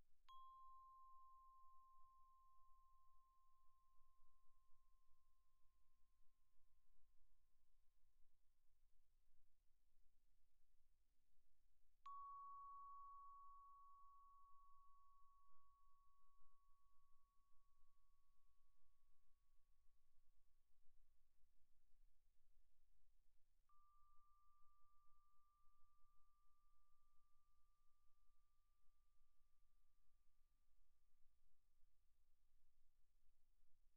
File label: bells, plastic, pp, C6 B6; Percussion